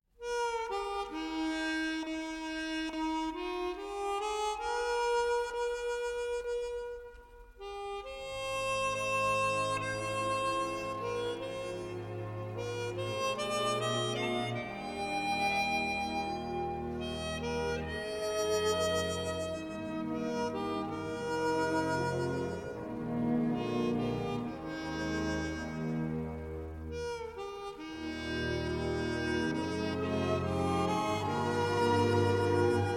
Dixieland (Harmonica)

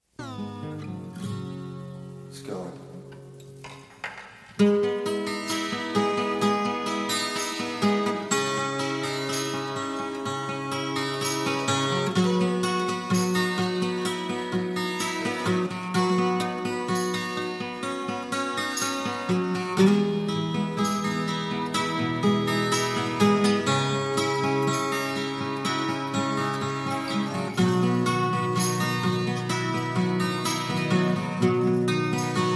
(Acoustic Version); 1986 Sound City Session

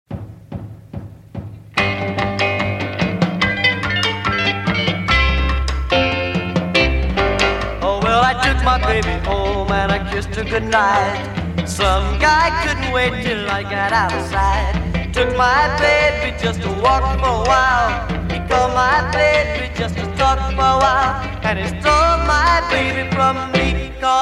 Doo Wop; Wuschel's Doo Wop Paradise Volume 109

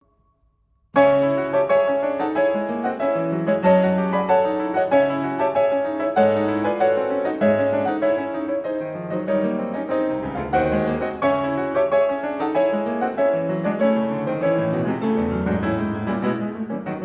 Symphonic Studies, Etude X, Piano Works; Vladimir Ashkenazy; Schumann

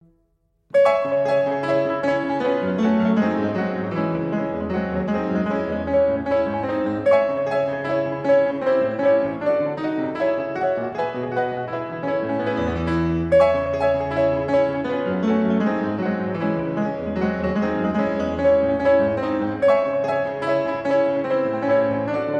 Symphonic Studies, Etude VI, Piano Works; Vladimir Ashkenazy; Schumann

